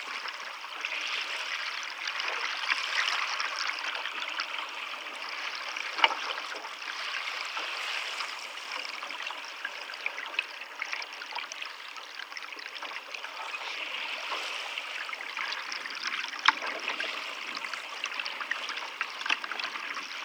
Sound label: Sailing